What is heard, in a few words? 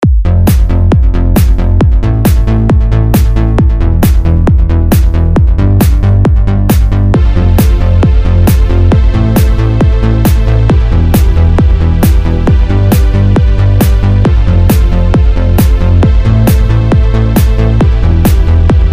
Trap